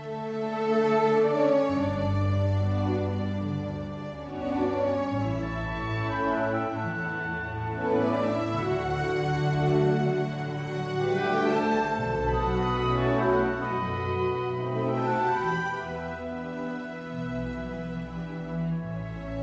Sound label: Orchestral Style; Disney